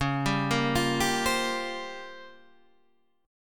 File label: A sharp Minor 6th Add 9th C sharp, Guitar chord